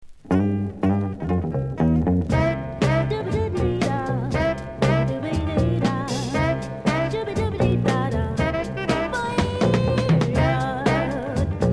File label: discojazz